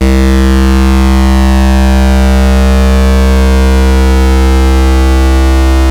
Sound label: Ultimate Bass Root C; Vengeance Samples